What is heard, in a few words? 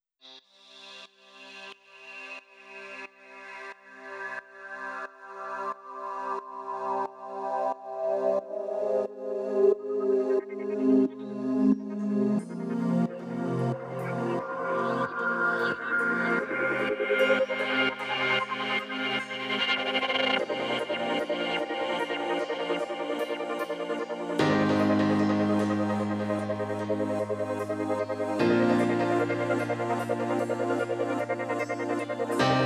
Pads 150